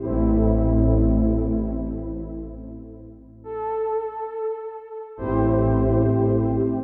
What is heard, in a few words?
techno pad 140; Techno2; Keys